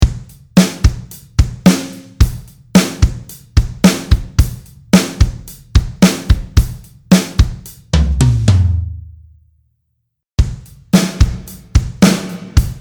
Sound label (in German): Drs ohne und mit Hall